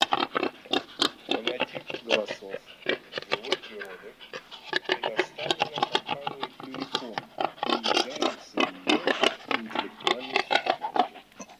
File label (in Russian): Кабан Cinghiale